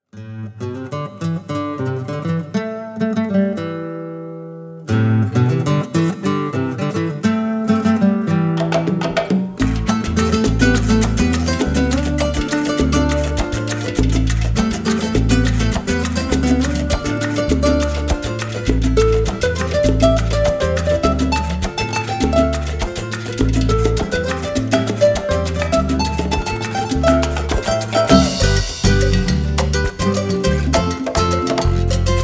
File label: Salsa Flamenca